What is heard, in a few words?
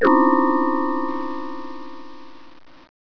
shwang; alertViz